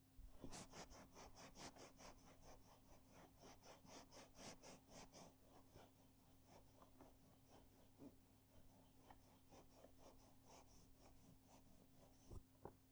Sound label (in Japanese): 絹連れ; ASMR